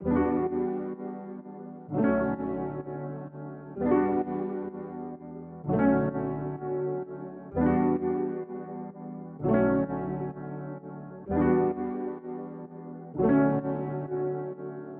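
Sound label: Piano Loop B minor 128 BPM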